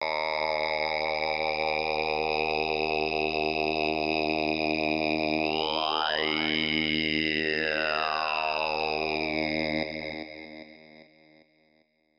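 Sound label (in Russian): буддийские звуки пение одиночный резкий близкий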